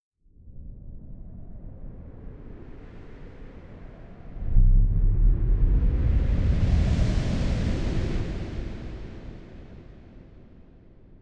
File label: reverb; vent fou